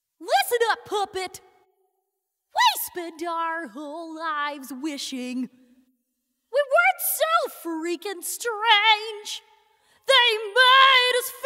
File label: Vocal Only; Shrek; Audition Cuts; Gingy